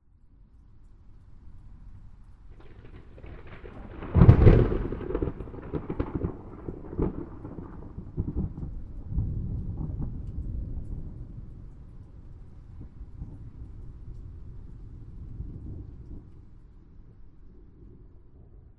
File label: Crisp Thunderclap